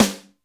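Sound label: Snare, Alesis D4 drum machine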